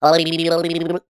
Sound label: cartoon dazed headshake vocal